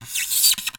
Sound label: Polystyrene Squeak